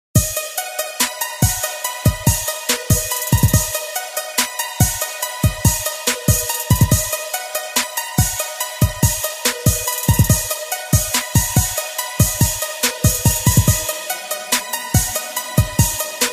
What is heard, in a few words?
intense hip hop